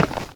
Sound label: metal footstep; minetestforfun game; nalc server